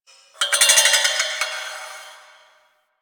Sparks; Ambience; Warframe; The New War; Quests; Zariman

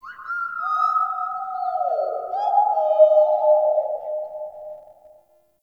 Bird Tropical; Ambience; One Offs; Glades Of Andurin

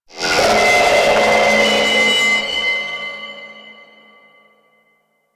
Speaker Stack Screech; Ambience; Props; Infested; Warframe; NineteenNinetyNine

